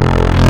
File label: Spectral Growl, wavetables; Spectral Growl Wavetables Vol 1